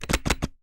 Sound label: Camera Sequence